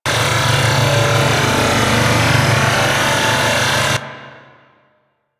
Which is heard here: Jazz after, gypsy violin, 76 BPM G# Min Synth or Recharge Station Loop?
Recharge Station Loop